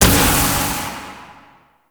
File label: Pillar Spawn Burst; Warframe; Revenant